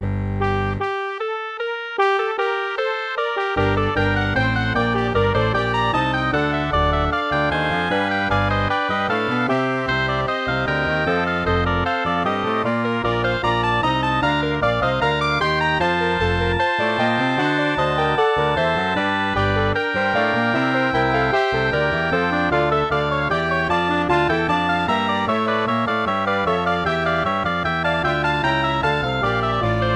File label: MIDI